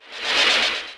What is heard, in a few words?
empty recycle bin; windows 98; Inside You COmputer